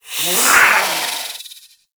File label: Catbrow Feral Vocal Attack Medium, Enemies; Warframe